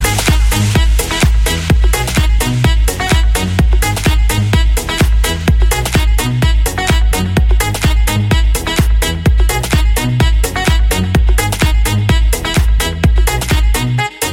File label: sax